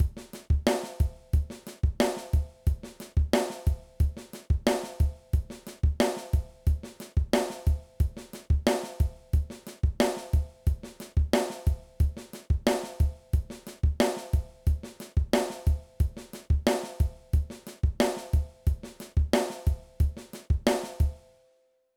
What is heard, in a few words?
Intermediate Drum Beats